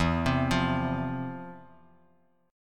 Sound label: F 5th E, chord; F5/E arpeggiated